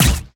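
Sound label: Laser gun Single; Lasrgun Loki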